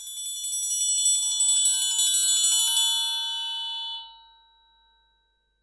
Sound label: triangle roll